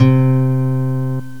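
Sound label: nylon string guitar; gmplay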